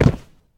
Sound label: player falling damage